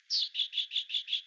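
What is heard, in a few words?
FULIGINOSA, Darwin's Finches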